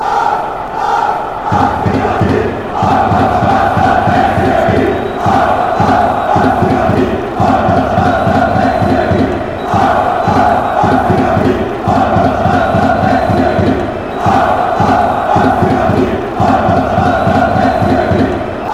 berlin, fan chants

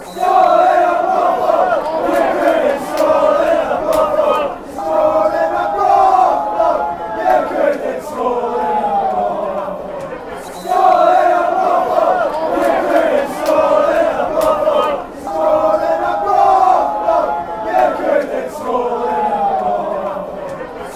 you couldnt score brothel fanchants

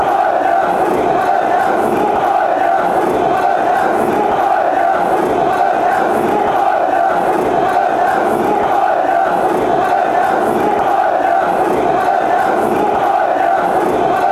clown, chants

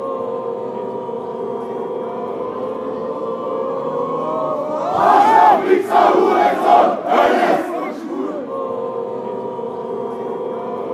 woaaah, fan chants

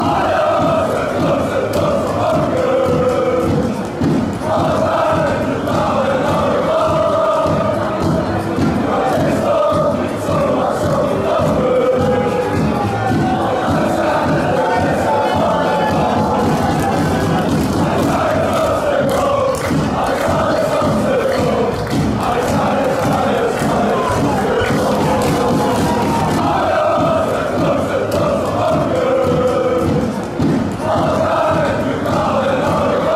ajax de grootste trots van mokum, chants

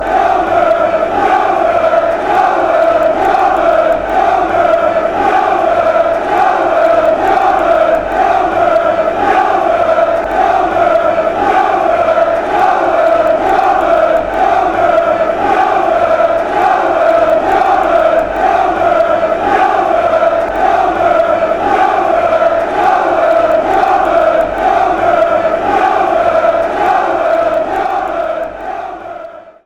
jews fan chants

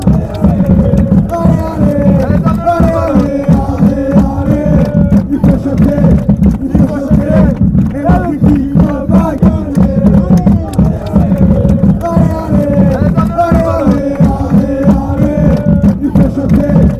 allez allez, fan chants

